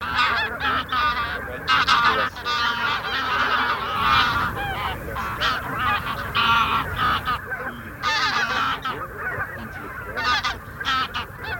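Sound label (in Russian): Гусь серый базар Oche Selvatiche, манок; chip mini gusiniy 5B plurifon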